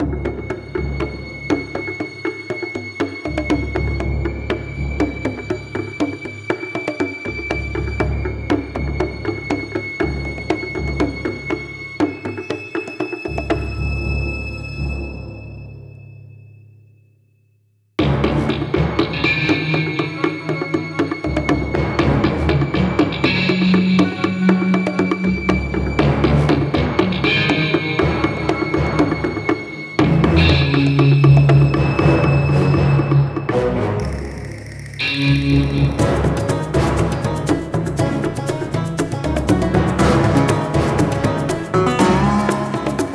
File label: Sneaking Attack Rebel